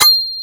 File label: DS8 C 3, Korg